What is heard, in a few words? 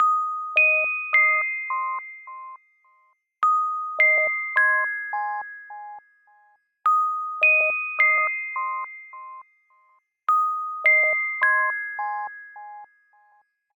Music Box, alarms; LG X Screen